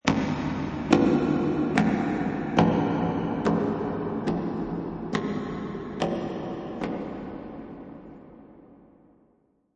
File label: Horror Foot Steps Reverb, Heavy, slow footsteps in dungeon; Horror - Heavy, slow footsteps in dungeon or large castle. Heavy, long reverb